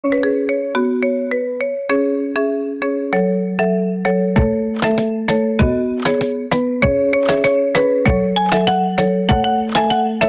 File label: Instrumental Ringtone